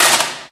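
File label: physics clipboard impact medium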